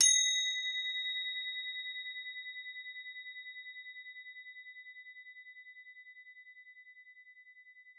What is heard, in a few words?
CRO B4, CROTALES; Xsample VOL-4